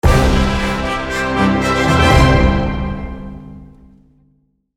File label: fanfare